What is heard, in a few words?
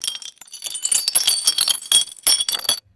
small ceramic debris tinkling and shuffling; objects